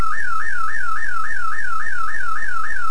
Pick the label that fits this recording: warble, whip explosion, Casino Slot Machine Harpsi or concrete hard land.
warble